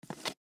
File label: Coffee Creamer; Coffee Creamer Package Full Pick Up; Foley Coffee Creamer Package Full Pick Up Wood Table; Drink Mixing; Blastwave